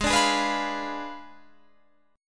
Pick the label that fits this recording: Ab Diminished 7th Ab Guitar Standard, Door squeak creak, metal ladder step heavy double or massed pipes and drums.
Ab Diminished 7th Ab Guitar Standard